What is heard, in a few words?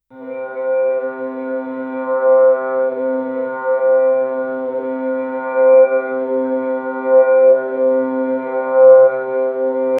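continuous soft, indian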